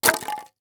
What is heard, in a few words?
Impact Styrofoam Hits Concrete